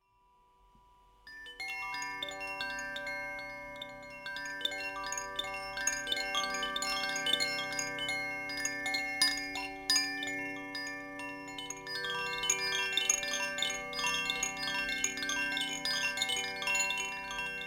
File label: fire